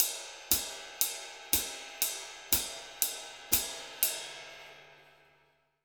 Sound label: RID, Jazzistic, 120; Univers Sons